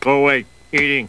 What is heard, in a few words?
eating